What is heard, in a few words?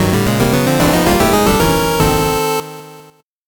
8bit jingle normal